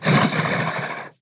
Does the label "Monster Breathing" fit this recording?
Yes